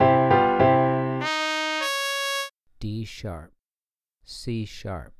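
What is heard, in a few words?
Trumpet 2 Note Melody Major d#3 c#4; ear training; melodic ear training for musicians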